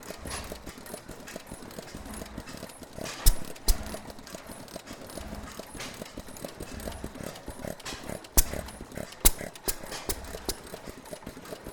4 hp fairbanks morse model t vertical circa 1910 running rhythmic, power museum; coolspring; sonniss gdc